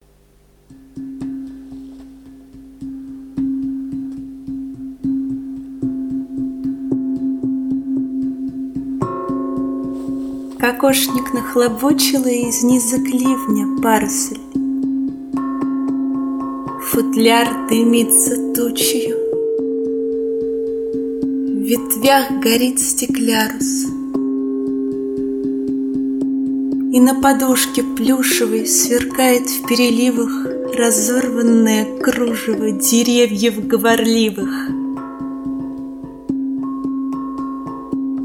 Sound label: kalimba; Dom Pasternaka; B.Pasternak